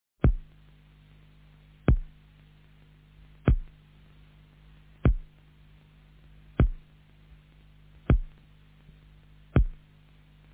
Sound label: Geiger Counter